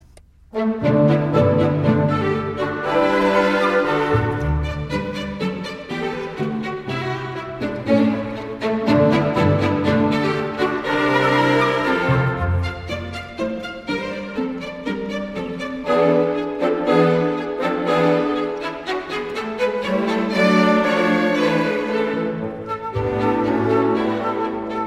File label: Guitar Concerto in D; Mario Castelnuovo-Tedesco; Guitar Concerto #1; Op 99